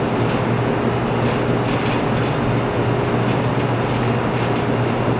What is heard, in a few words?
ac vent blow